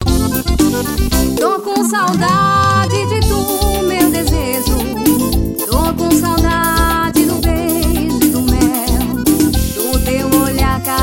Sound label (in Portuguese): forró